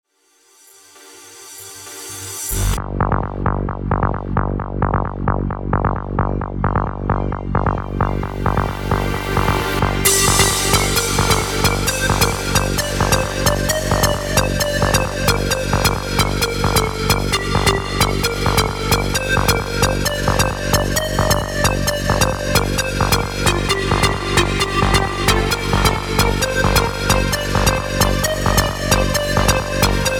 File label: Rising Flute